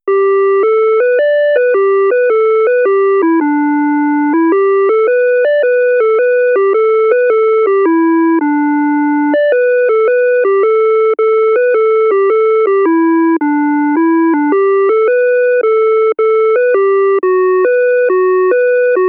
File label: Traffic Lights; Yakuza 3; PS3, PS4, Windows, Xbox One; Ryukyu Traffic Lights